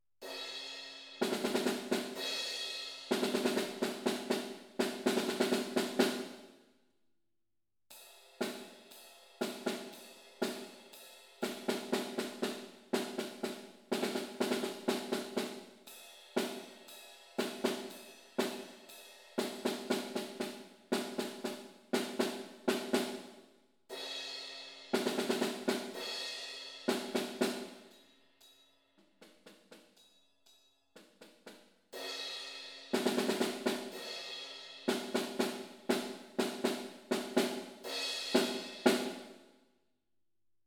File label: Rockfeeling